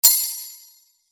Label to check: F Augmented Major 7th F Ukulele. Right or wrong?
Wrong